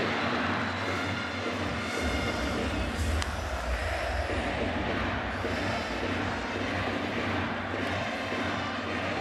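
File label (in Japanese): yakyuu ouen, baseball cheering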